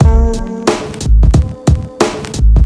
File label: hiphop drumloop fatten up